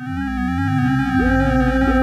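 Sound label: H2O PARTICLE; Pro-Rec - Synthsonic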